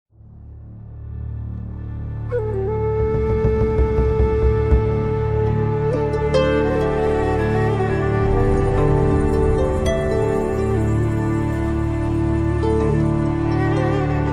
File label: whistle bodhran; intro avec bodhran et whistle